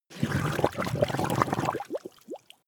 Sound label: liquid slurp large